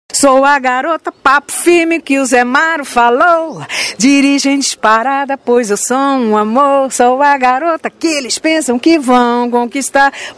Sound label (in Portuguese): vinheta cantada